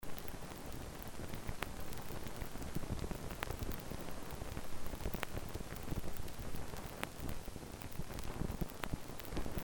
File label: crackles, Vinyl